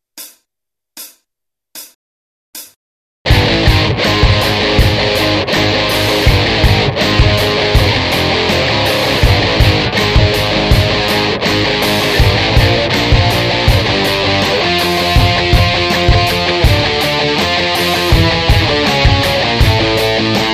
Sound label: whisper, guitar